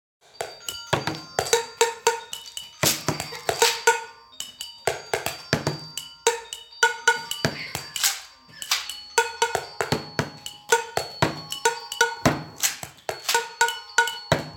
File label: Family melody; Familia creativa Orange